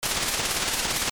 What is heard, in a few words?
grind; openbound